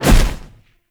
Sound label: Dash Hit; Powers; Warframe; Focus; Operators